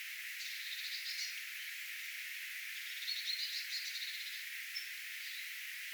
siinakin on hiljaisena sellainen tulit-aani mika laji; mikä lintu?, sama kuin edellä?

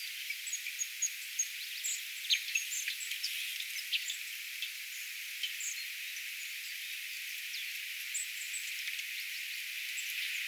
tolleen aanteleva puukiipijalintu

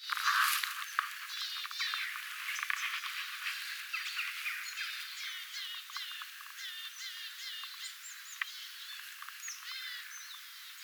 yhtakkia rantametsassa kevellessa lensi vierelle parvi homotiaisia ja vihervarpusia

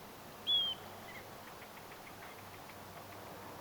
onko jonkinlainen nuori kapustarinta

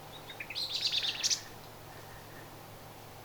tuollainen keltanokkarastaslintu

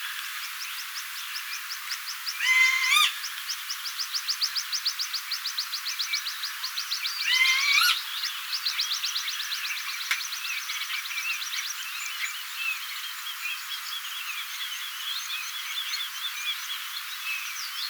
niittykirvisen laulua; hieman niittykirvisen laulua

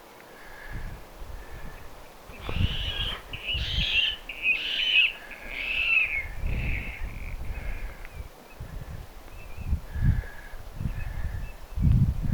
luhtakanan aantelya

